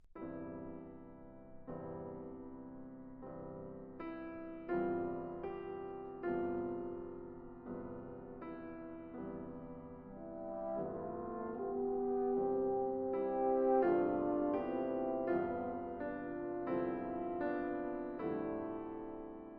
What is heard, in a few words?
Concerto For Piano And Wind Instruments - Mvt. 2. Largo